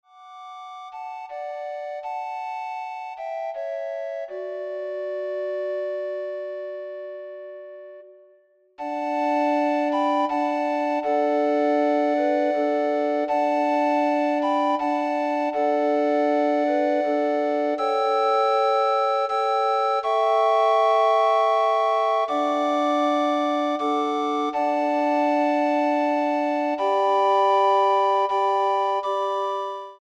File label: Recorder Ensemble